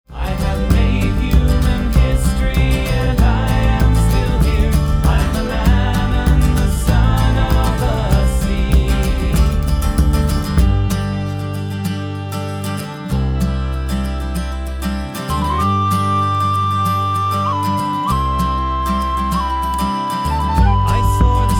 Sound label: Whistle and Fiddle; This is the last part of the chorus so you can hear where the whistle comes in BETWEEN the chorus and next verse.